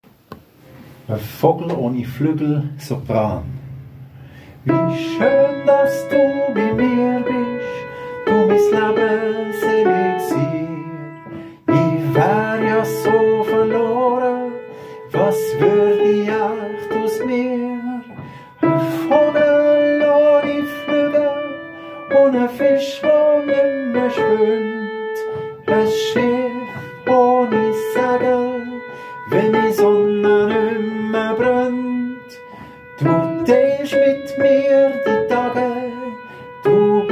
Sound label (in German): E Sopran